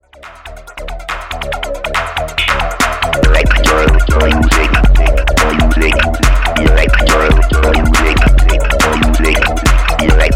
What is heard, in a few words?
Electro Muzik